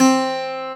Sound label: CG HARD C3 R, Celtic Flavours; USB Soundscan vol.42; AKAI